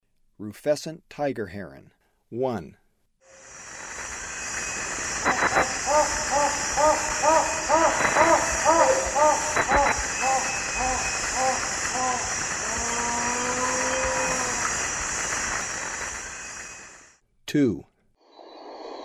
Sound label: Rufescent Tiger Heron, Ecuador; S. America